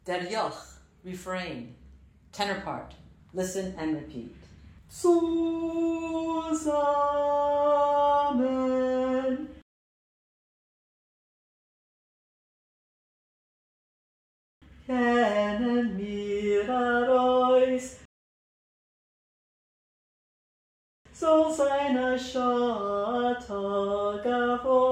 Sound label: refrain tenor drill; Listen and repeat; Der Yokh